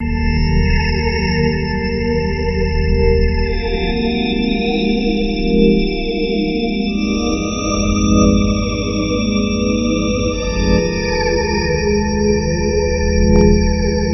ATMO; SpheratorFM; Extrasensorial Vibes

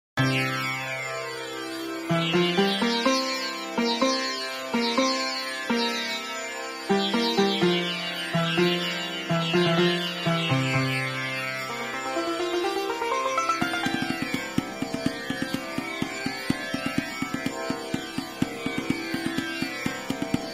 Tanpura A0 B3 Sitar C4 C7; Sitargen; syntheway

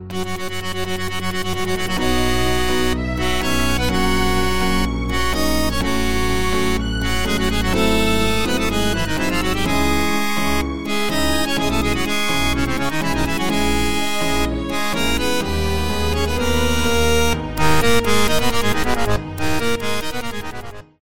Accordion; Harmodion

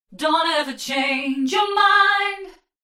Chorus Choralizer Vocals Wet; Vox Quantizer; syntheway

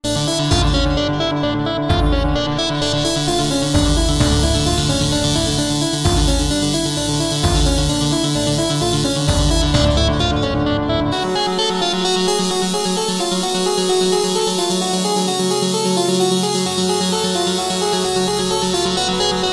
LEAD Spatial Depth; Phasewaver; syntheway

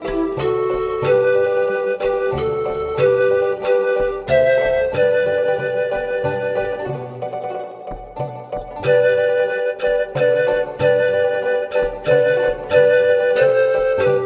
Andean Quena South America; Woodwinds; DAL Flute; Syntheway

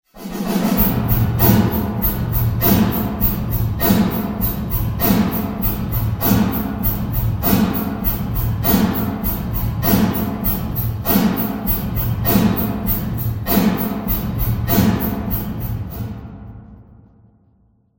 Ambience Reverb Drums Wet; Echo Chamber; Echo Chamber is a stereo reverb and delay plug-in; Syntheway

